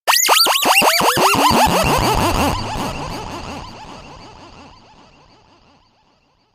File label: allien fx mix